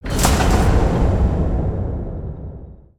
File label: distant objects rattling; ninjago